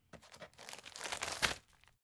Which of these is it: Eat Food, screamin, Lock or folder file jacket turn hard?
folder file jacket turn hard